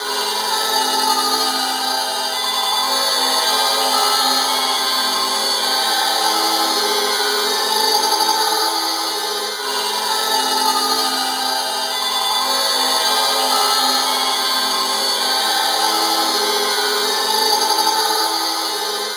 Processed Vocals, Loops

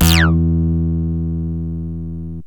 bl dist, yamaha rm1x synth bass and lead

